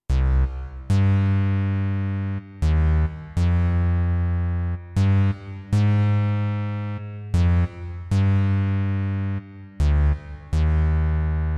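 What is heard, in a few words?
Synt Bass, digital piano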